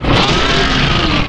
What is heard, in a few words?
antlion guard pain; npc; Half Life 2; Episode 2